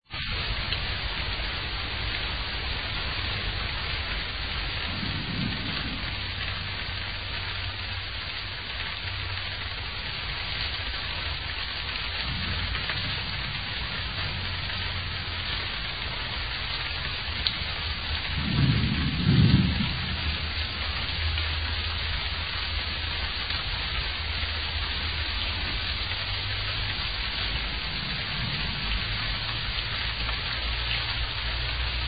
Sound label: Pleasant sound of rain